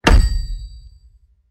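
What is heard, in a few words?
heavy dull blow, cold weapon; holodnoe oruzhie; tyajlyiy gluhoy udar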